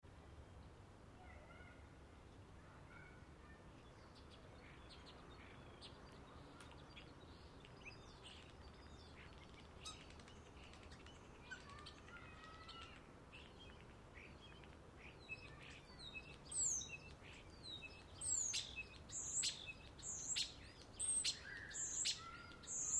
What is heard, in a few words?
Birds Suburbs Southern Ontario Light; Spring; Animals